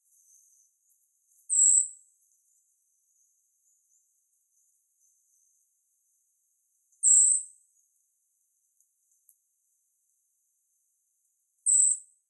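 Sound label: eyebrowed thrush, utsunomiya; mamichajinai; koe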